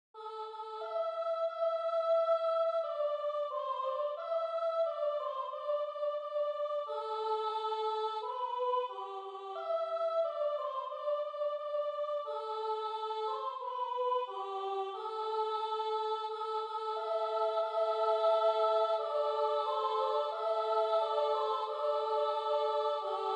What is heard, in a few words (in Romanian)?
colinde, Dobrogea; Romania; Sus boieri nu mai dormiti